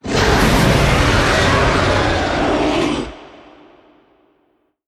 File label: Dinosaur Roar